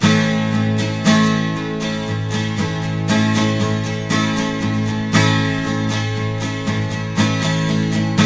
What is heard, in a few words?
12 STR MAJ A#, TWELV; Spectrasonics; Hans Zimmer Guitars VOL-1